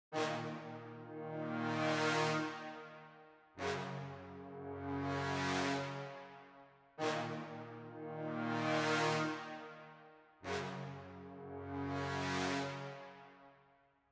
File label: BRASS ONLY DRY; KONTAKT LIBRARY